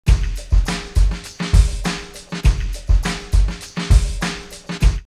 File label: Chic Loop, drumloops, OldSchool; X-Static Goldmine